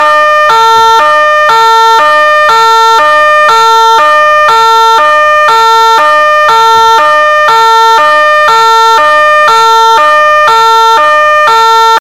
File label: Police Siren